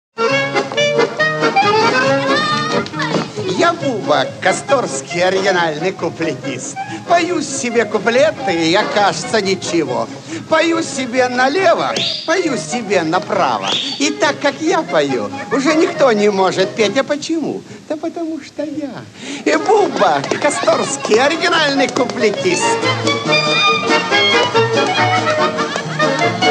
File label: song; avengers